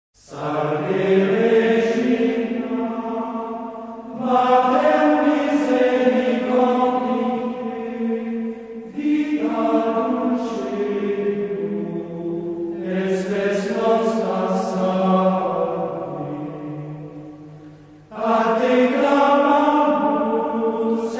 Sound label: Salve Regina Simple Tone, choir schola; Holy Trinity Sunday thru Saturday before Advent